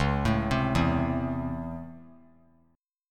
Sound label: Db Minor Major 7th Sharp 5th Db down; DbmM7#5 arpeggiated; JGuitar